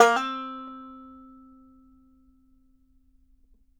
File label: BANJO NOTES, C 4; Sampleheads; Whole Lotta Country 1 & 2